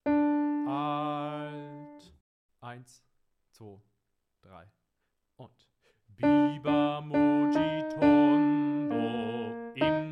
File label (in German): Alt allein